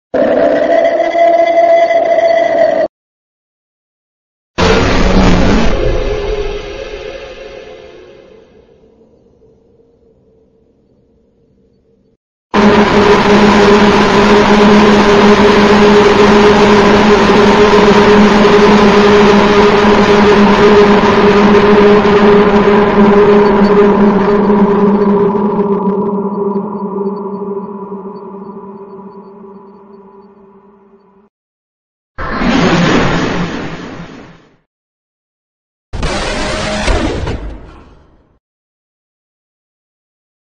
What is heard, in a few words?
suoni horror the ultimate horror fx collection sound effect horror bhoot sound